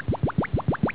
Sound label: blop